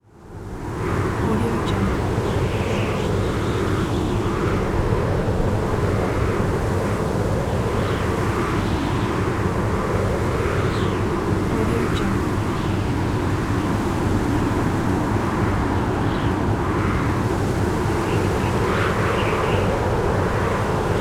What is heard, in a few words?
blizzard